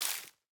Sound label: Leaf litter place; Ściółka